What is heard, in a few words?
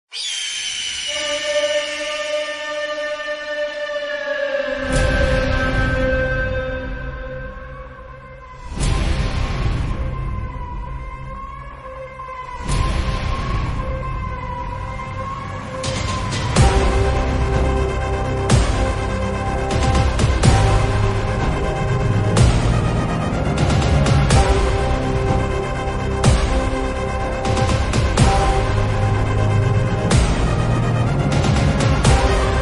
Karaoke Ringtone